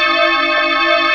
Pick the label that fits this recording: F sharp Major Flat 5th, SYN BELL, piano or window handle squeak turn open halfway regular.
SYN BELL